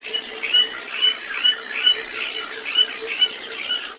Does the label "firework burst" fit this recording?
No